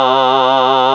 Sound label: VOI FEMA, vocoders; Sound & Vision - Gigapack I CD 2